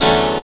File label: CHORD, Drum & Bass, 160 BPM; Ueberschall; Drum & Bass Flash Trackz